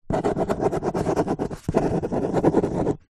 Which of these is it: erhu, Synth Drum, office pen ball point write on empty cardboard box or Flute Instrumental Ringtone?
office pen ball point write on empty cardboard box